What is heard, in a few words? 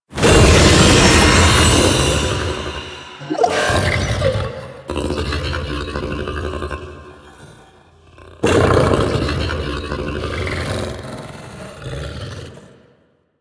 Death Scream; Enemies; Vocals; Warframe; The New War; Archon; Amar